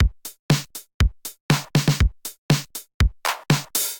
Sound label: 707 Kit groove 120 bpm; MIDI Clips; 111 - 130 bpm; 707 Funky Beans Kit groove; Ableton; Factory Packs; Drum Essentials